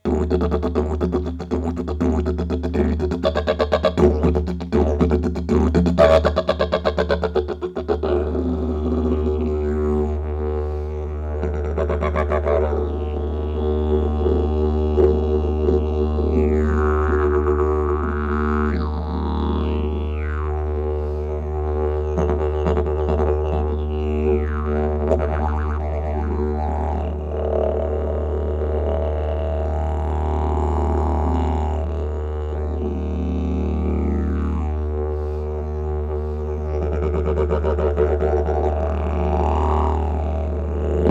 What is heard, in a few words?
didge sample